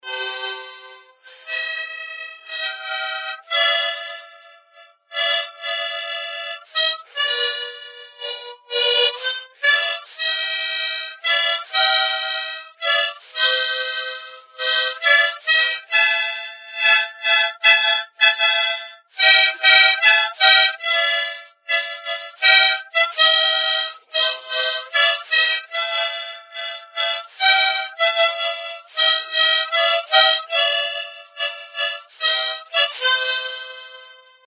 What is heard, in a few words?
harmonica